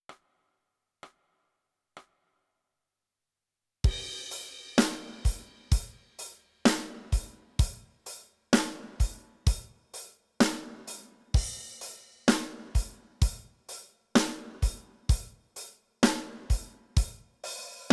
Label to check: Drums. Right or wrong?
Right